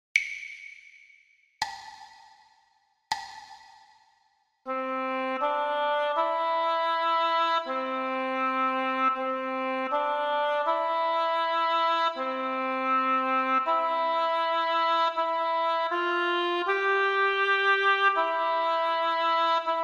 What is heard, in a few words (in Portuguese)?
percepcao ditado